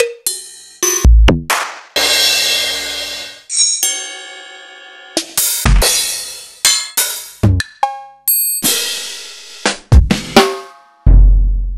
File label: EMU Proteus-2000; Sliced; m8